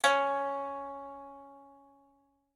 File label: pipa